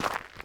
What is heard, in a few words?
dirt step; Original TK Sounds